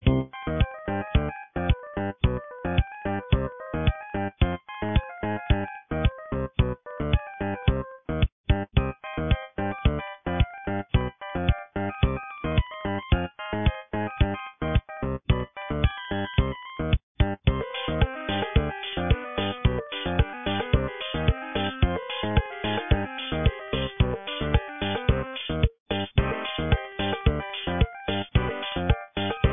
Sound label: latin ringtone